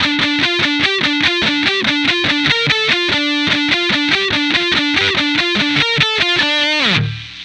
Guitar

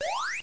throw

